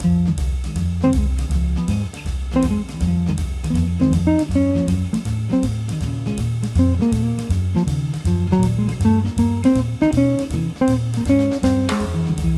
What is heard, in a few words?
tarantella variation; bwv 1056